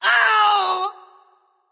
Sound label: ouch; smite; ahmuzencab